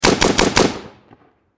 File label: Warfare Machine Gun Bren Lmg Four Shots Close Perspective